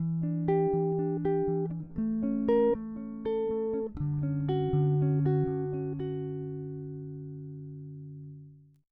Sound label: Ibanez EHB1006MS Chords Both passive